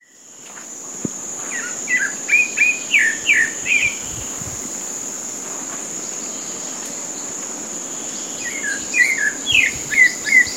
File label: Turdus leucomelas golfe; I Comandatuba BA; Silveira